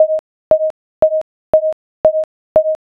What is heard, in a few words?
railroad